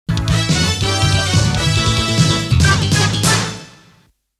Opening Jingle; Rezonance World Zuntata 30th Anniversary CD Box Limited Edition; D3BOSS

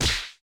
beam

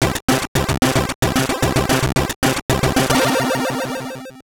Start Race; Supercross Freestyle 2000; GBC

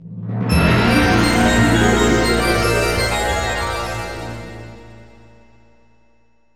Timeloop Start; Star Twins; Nintendo 64

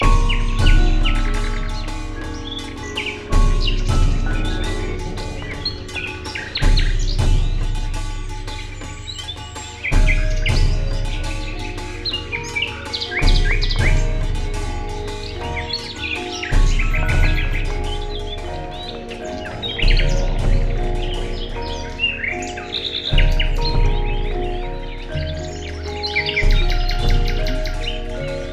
Gamelan; One Island, One Resort; Flower, Sun and Rain; PS2

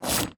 Skill Slash; portal quest; android; Magic Shrek Skill1 Slash2

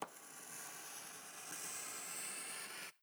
end of month drawing

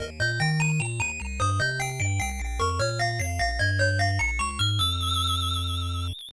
Game Over; Abadox; NES

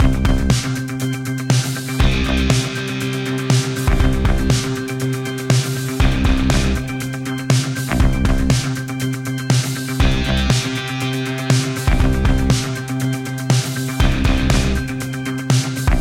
Training Level 2 Loop; Quest Rookie Med TrainingLevel3; club penguin island; android

